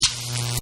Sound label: electric hit